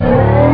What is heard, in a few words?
Shield UP; Final Frontier™